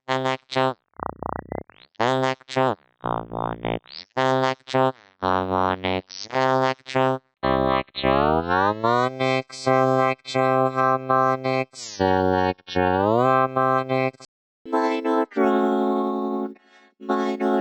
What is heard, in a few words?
EH V256 Robo and Drone Pitchsweeps